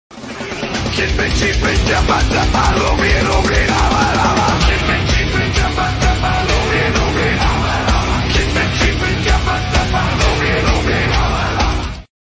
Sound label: metal